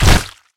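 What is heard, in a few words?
sensitive booming hit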